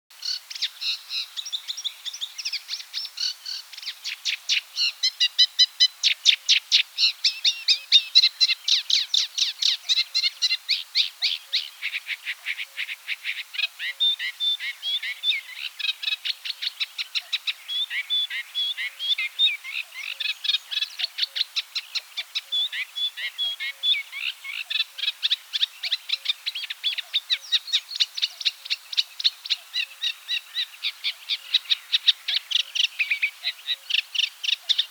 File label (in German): Teichrohrsaenger Acrocephalus scirpaceus